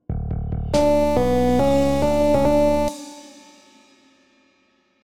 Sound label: Victory; new Humanity